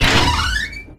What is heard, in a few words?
hotel door open